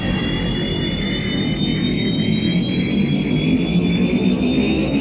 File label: warp start; Earth and Beyond; EA Games